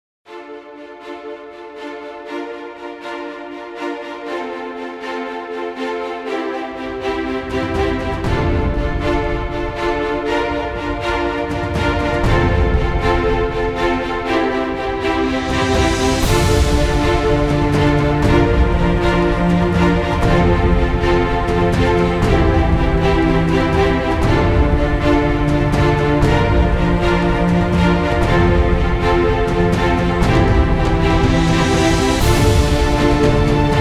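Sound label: Epic Inspiration New Year Countdown Version generic music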